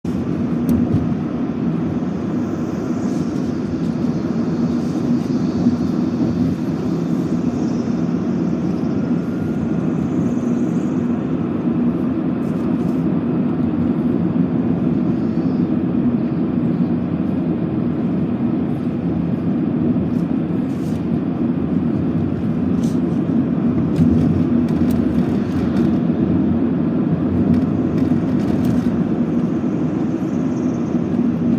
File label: noise; Elesta